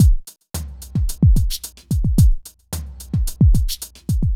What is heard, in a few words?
r n b kit 110